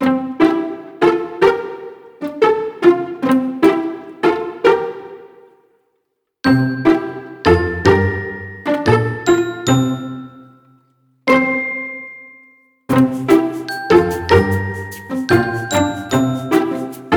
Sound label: Funny Cartoon